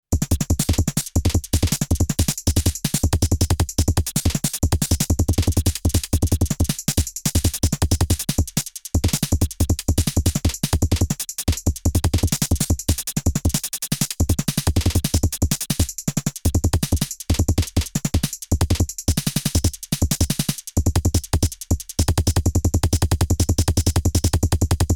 Korg drumlogue Random Fills

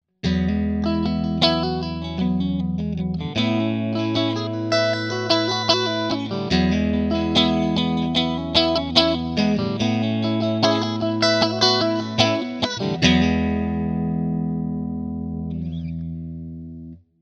Fender AM Orig. 60 Middle and Bridge clean

